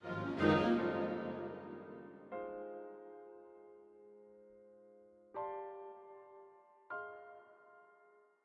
full horns; Sky Children of the Light gamerip

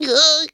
frustration; ice age scrats nutty adventure

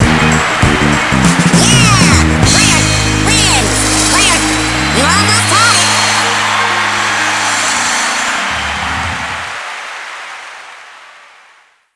Battle Mode End (You almost had it)

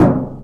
Physics Metal Box Impact Soft; Counter-Strike: Global Offensive

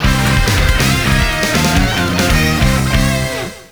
Checkpoint; Midnight Run Road Fighter 2; Konami ZR107; arcade

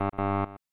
Chance Game Incorrect; pro mahjong kiwame; snes

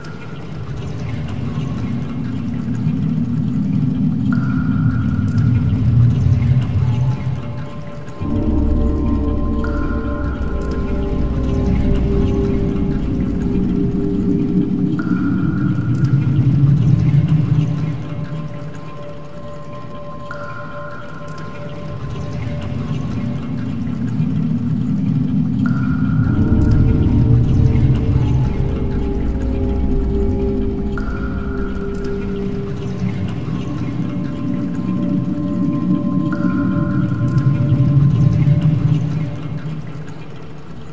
Sewer Ambience; Criminality

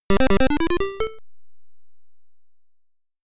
Homerun; Champion Baseball; SC-3000

